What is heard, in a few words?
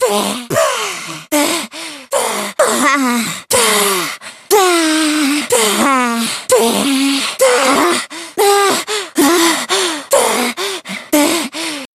amazing music